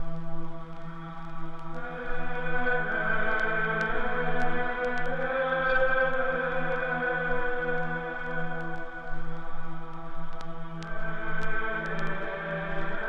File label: singing; cylinder